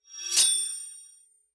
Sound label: Sword Recharge